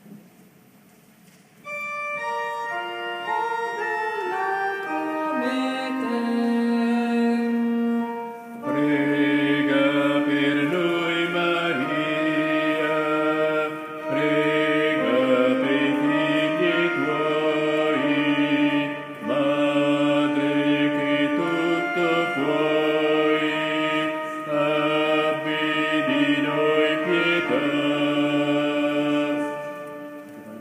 Ritornello

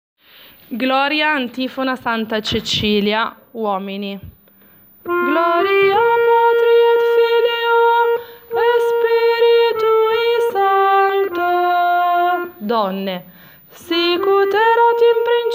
antifona uomini; Gloria; Santa Cecilia

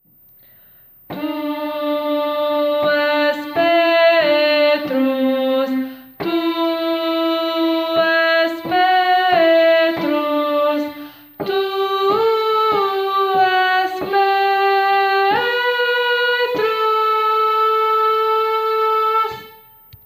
Tu es Petrus contralti

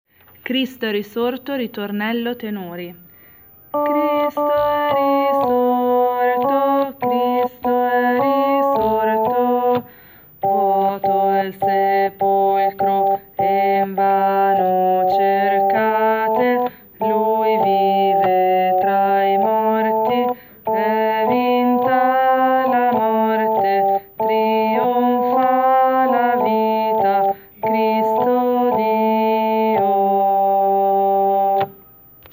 ritornello tenori; Cristo è risorto

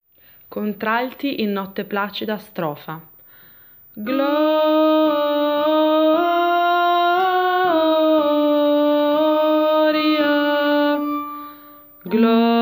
strofa contralti; In notte placida

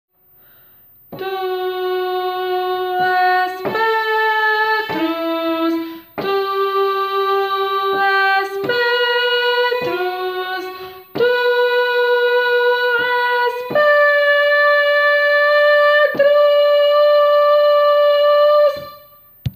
Tu es Petrus soprani

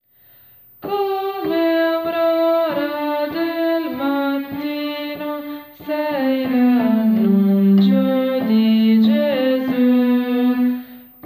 Come aurora del mattino contralti

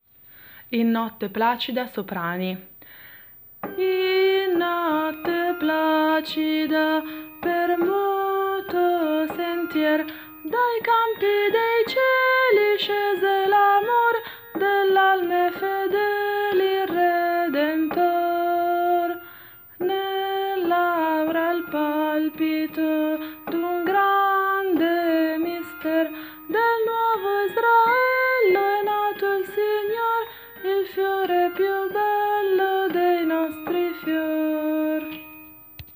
soprani; In notte placida

